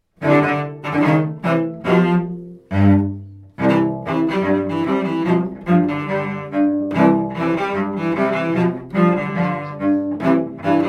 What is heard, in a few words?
Ragtime